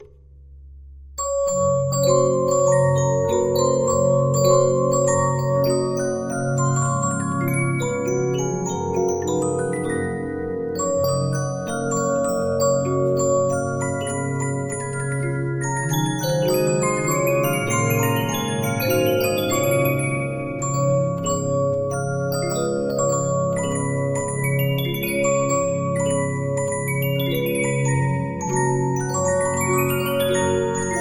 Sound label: Regina, 15inch disc musicbox